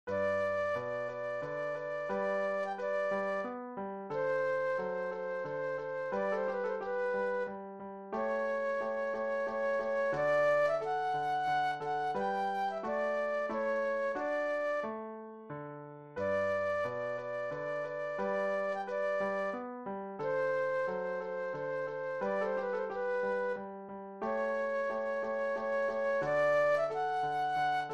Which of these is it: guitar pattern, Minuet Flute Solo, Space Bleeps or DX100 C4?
Minuet Flute Solo